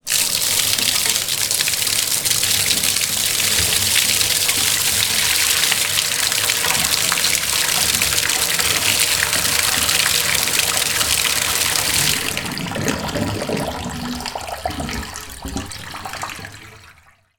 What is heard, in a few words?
household bath water tap on plug out off drain; zapsplat